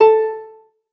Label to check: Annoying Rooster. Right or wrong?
Wrong